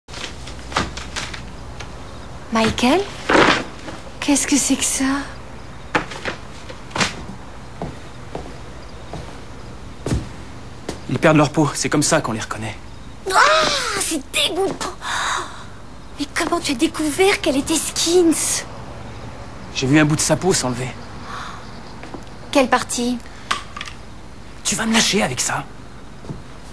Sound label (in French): peau